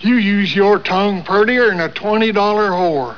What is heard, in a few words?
tongue